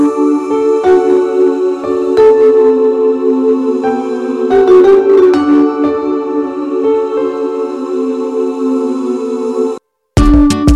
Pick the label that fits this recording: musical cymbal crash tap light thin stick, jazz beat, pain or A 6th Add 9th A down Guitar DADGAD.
jazz beat